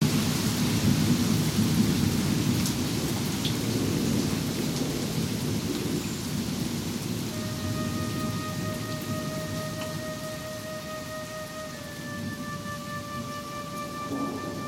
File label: Rain Flue Clarinette